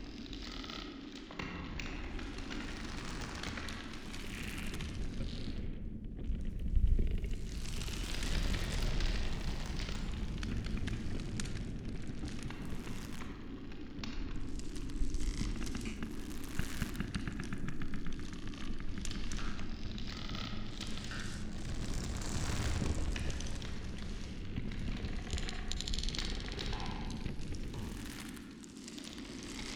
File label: atmos shellwood; Hollow Knight: Silksong